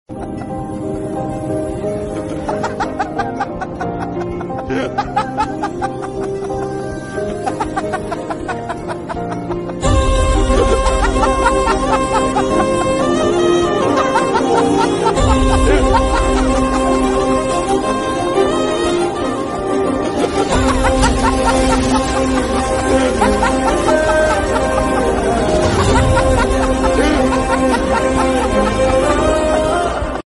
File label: Laughing Bgm